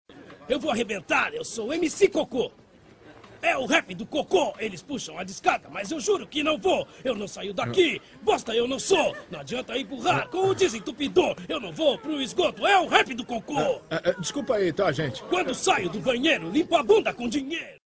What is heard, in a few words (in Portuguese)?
rap